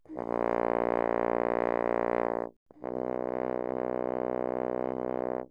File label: Horn ff Bb1 B1, french horn; Brass